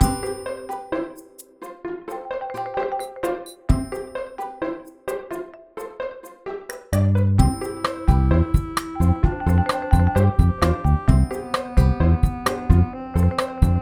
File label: Cute